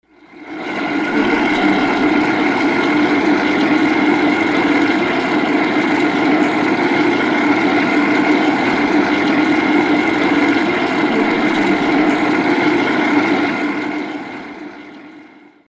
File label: vortex opening steady